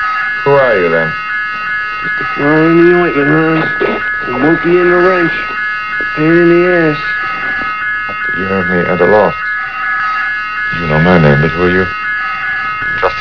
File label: noisy echo Planar